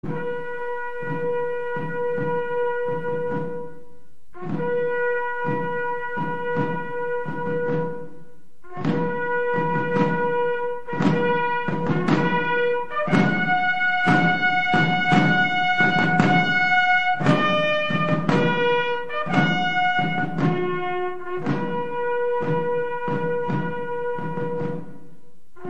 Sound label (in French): aux morts française